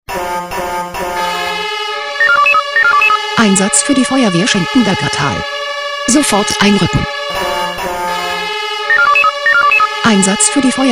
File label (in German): SMS Alarmierungstone; FW Schenkenbergertal